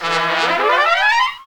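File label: brass trumpet; Roland L-CDX-03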